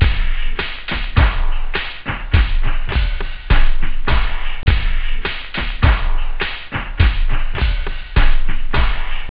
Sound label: 135bpm, loop, techno